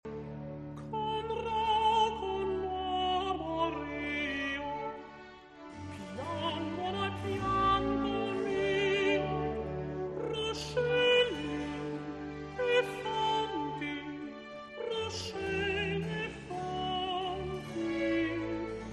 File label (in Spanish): contratenor